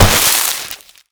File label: pinata break; Spanish